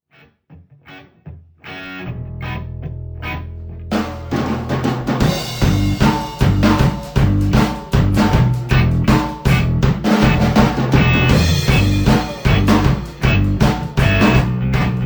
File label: reggae